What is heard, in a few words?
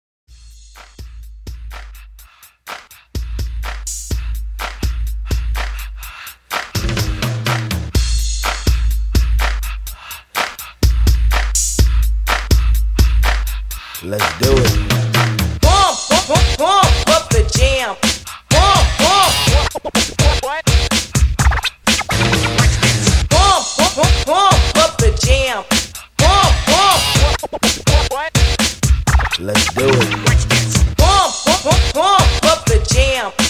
Intro Party HipHop 125Bpm